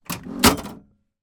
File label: van seat distance from pedals adjust mercedes sprinter; transportation; sound effects; sound effects one